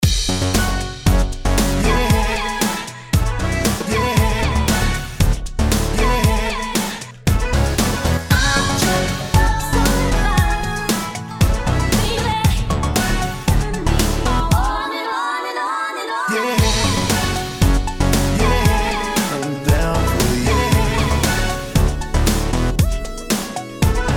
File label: oomd emp loop